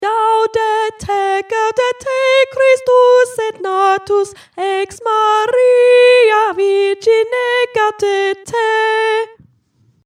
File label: Gaudete Soprano